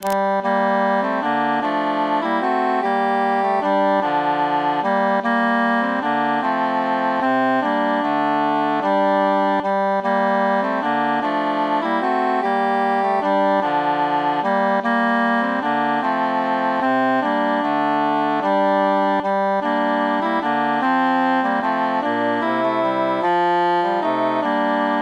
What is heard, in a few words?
three country dances altus mute